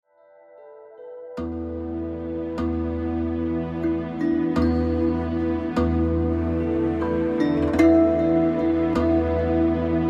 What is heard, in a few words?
Sansula Rave